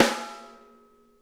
RIM, snare; Sonic Reality; Interactive Drum Kits

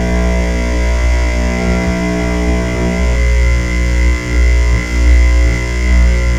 RAZOR RES, Razor Keyz; SFX

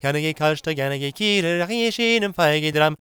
GAELIC, World Voices; ILIO; Vocal Planet

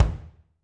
BD, Drums; Bob Clearmountain Drums I

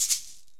PERC & KALIMBA

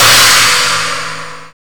CHINA CYB; Greytsounds VOL-1; SDX HATS+CYM

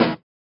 SWUSH; Spectrasonics; Metamorphosis

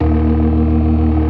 STOPS, Synth and Organ

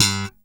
POPPS G3, SLAP BASS

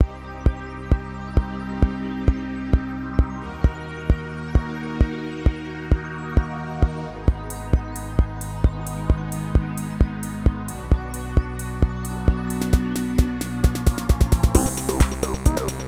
132 bpm, Old Skool House; Big Fish Audio; Marshmellow; Construction Kits

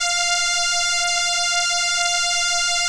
ELKA, String Machines; ELKA RHAP

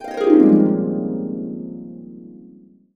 Ab MAJ, Harps; USB Soundscan vol.62; Complete Harps; AKAI

